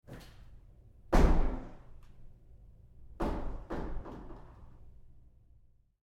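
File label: Door Bathroom Stall Open Roomy Med with Bounce; Bathroom Sound Effects; Big Room Sound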